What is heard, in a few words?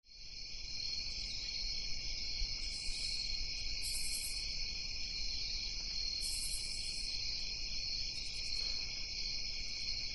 crickets faded ends for loop